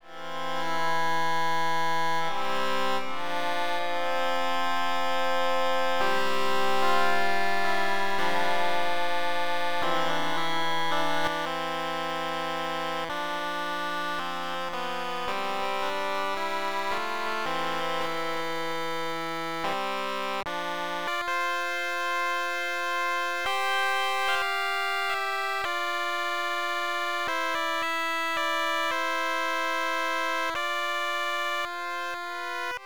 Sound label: synth; wonder synth01 by lo bit record